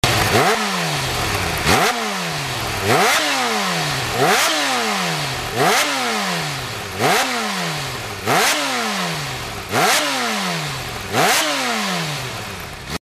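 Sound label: H2SX, Auspuffanlagen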